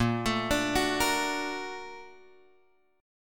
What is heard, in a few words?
A sharp Augmented Major 7th A sharp, chord; A#+M7 Chord {6 X 7 7 7 6} arpeggiated